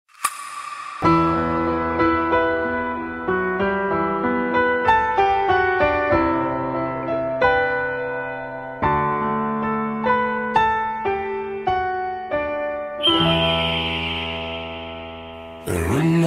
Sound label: remix